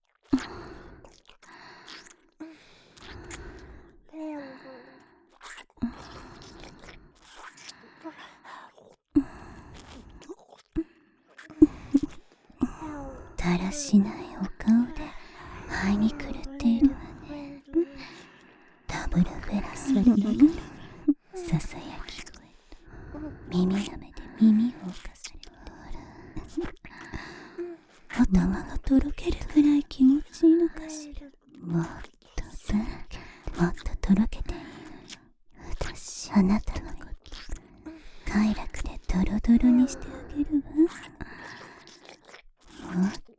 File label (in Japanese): ループ 左耳舐め＆右吐息＆交互に言葉責め; 人外姉妹のダブルフェラ～囁き声と耳舐めで脳みそトロトロになるまで嫐り犯してあげる～; 妹すたー